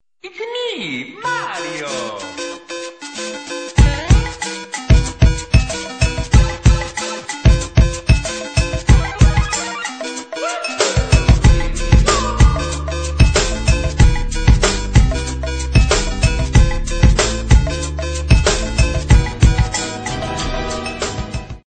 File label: mario hip hop remix; toques para celular